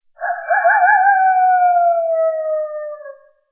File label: coyote